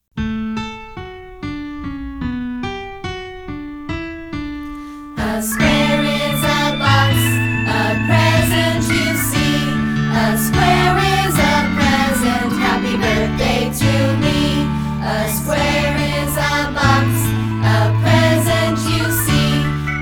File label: square